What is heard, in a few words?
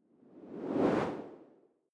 whoosh simple incoming; swooshes-swishes